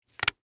Rope Cut Twine, Industry, Rope, Cut, Twine; Hand Tool; Tools

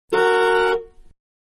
Big Car Horn Medium Interior; Vehicles; Car Big-Horn-Medium, Interior; Cars; Manhattan Production Music

